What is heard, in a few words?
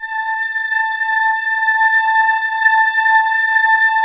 PROPHET VS, Vintage Keyboards